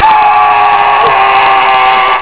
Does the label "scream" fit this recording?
Yes